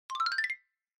Xylophone Slow Short Up

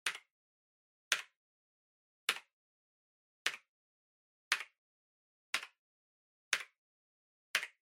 PC-Keyboard (Far-Single-Hard)

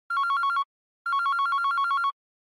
Smartphone Ringtone Dry

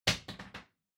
Lighter Far Drop

